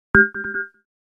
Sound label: Futuristic positive accent